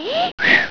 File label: INHALE